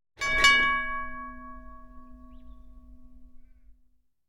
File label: Streetcar Bell; KMP Film Video Sound Effects